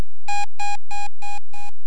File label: fading